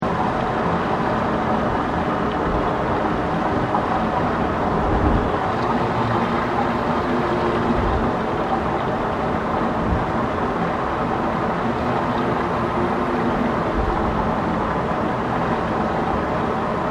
PUMPING STATION HOT SPRING EXHAUST DISTANT, Japanese hot spring pumping system; Machinery; Sound Effects:Machines